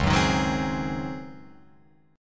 Db Major 7th Suspended 2nd Suspended 4th, Db, Guitar, Open F; 1,1,3,1,3,3; DbM7sus2sus4 strummed; JGuitar